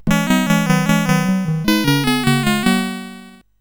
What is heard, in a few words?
Narrow Squeaks, CSG8580R5; Orm and Cheep